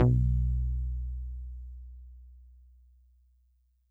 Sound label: Solid Bass DX100 B2